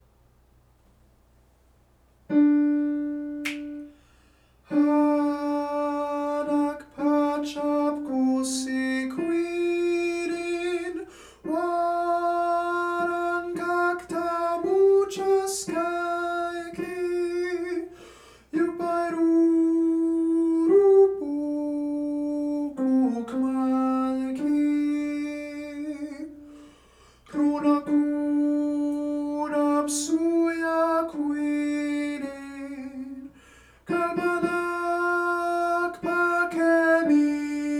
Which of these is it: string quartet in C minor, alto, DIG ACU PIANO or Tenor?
alto